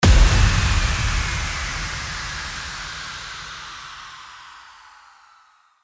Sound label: IMPACT